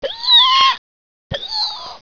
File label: hiccups